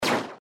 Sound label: bullet